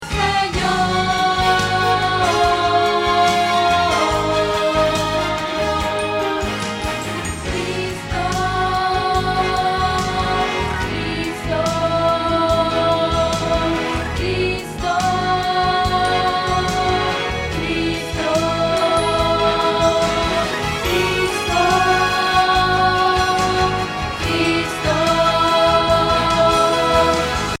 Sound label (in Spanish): Contralto